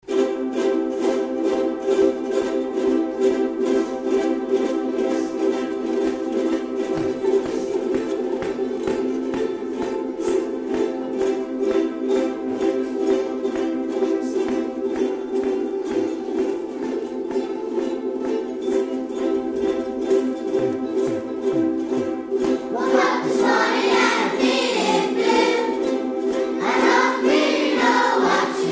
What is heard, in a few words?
ukulele Blues